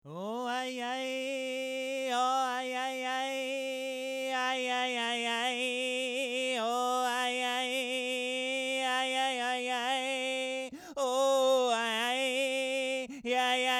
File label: voc